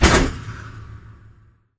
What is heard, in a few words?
sliding door ext verb close stop; Titanfall 2